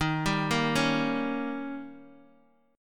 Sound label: Eb 7th Eb, Guitar DADGAD